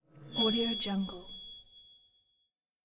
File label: metal shine